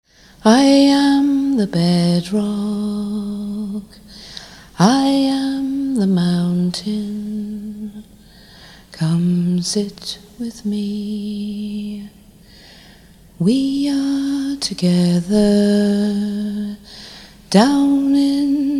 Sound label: rock